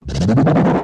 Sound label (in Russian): udar